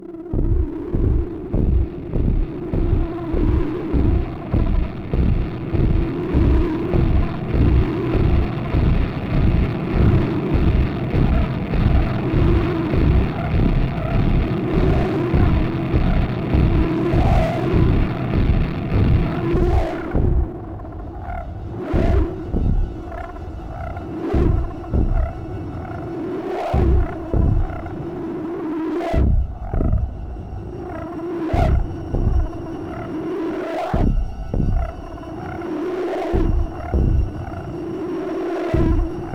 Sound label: Fight Drone